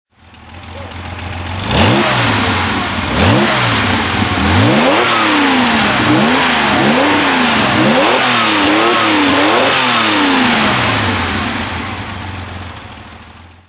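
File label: porsche 914 6 throttle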